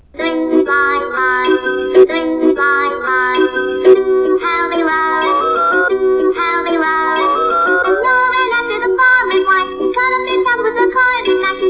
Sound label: Three blind mice, Human singing song